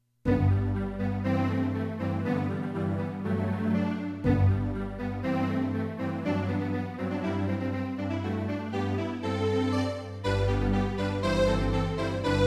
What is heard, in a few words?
prelude; gaskell